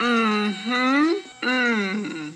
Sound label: mmm hmm mmm, voice; ooh ahh